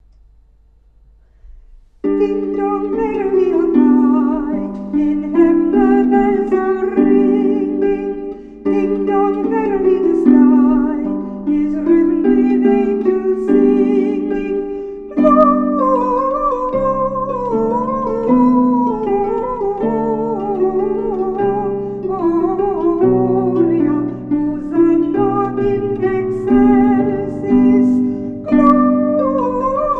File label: Ding Dong Merrily on High, Christmas Carols